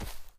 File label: PLAYER FOOTSTEP; Dino Shift 2